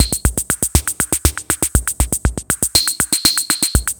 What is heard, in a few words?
Drum Groove with Effects